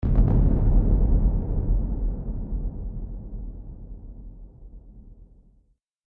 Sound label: War Drum Tonal Triple Impact Accent Boom Deep Thud; Horror Sound Design Trailer